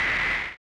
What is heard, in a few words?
Cymbal; Ableton Live 12 Lite; Core Library; Drums